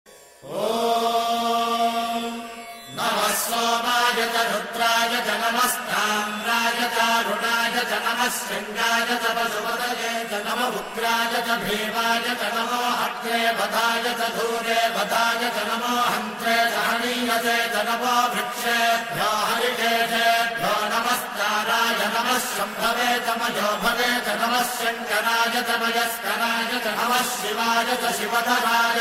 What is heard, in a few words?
om shiva om, shiva ringtone; Navratri Special